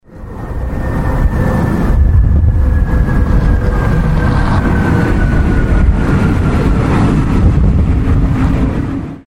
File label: Airplane landing